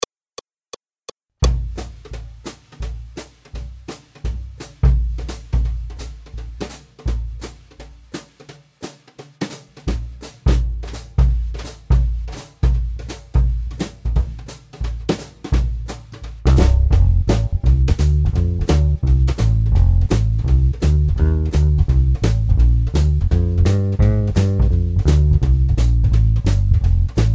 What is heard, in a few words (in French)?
Eleven bars boogie blues Accompagnement seul; Jazz